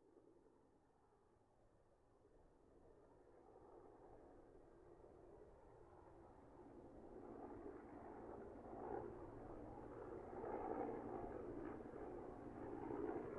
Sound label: Helicopter flying in great distance